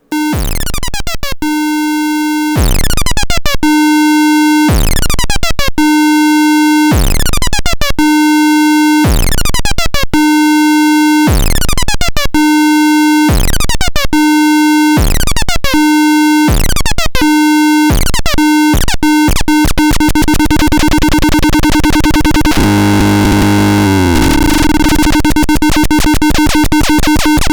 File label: saa1099p test